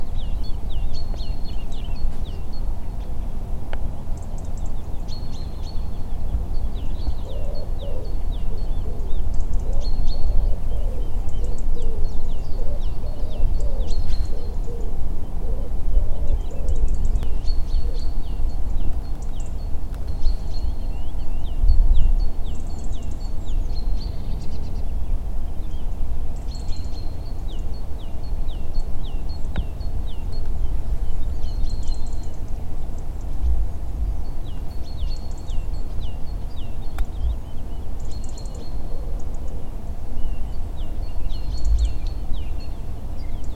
plusieurs oiseaux

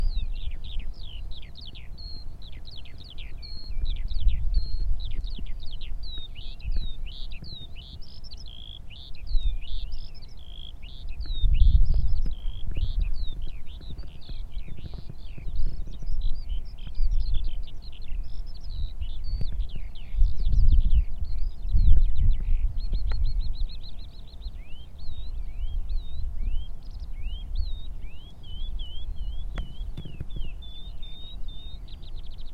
Alouette des champs

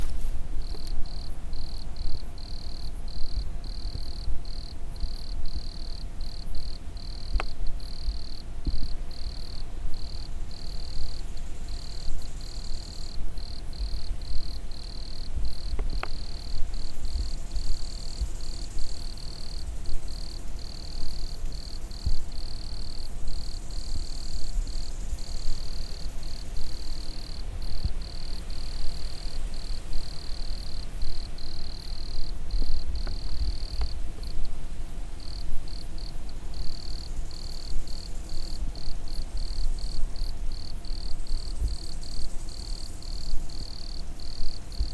Grillon des bois

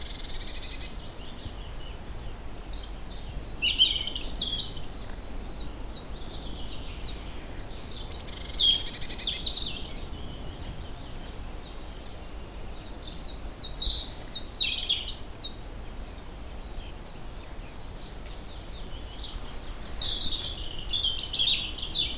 ambiance oiseaux

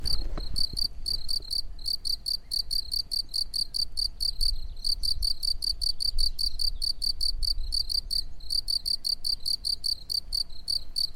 Grillon des champs; 13h

